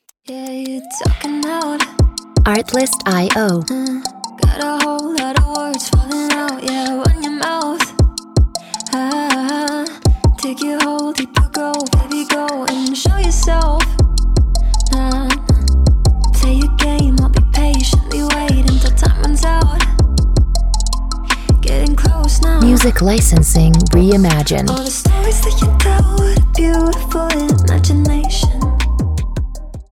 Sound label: Lie Ringtone